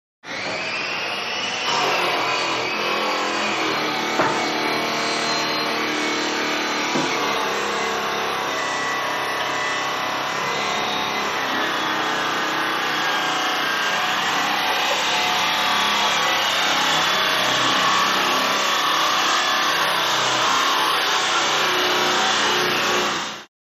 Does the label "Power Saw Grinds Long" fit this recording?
Yes